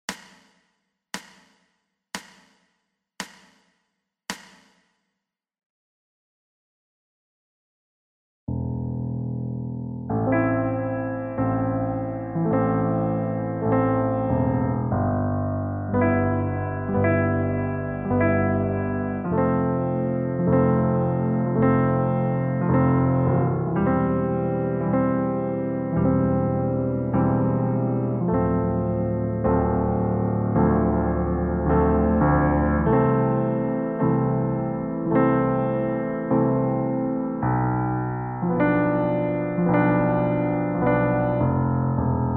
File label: Sad cello piano play